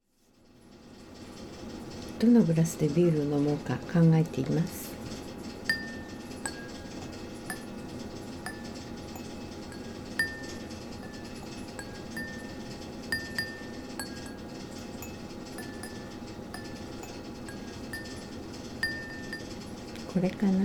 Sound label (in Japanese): 手作りグラスの音